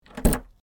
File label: pull key, アパートのドア鍵を抜く; pull key1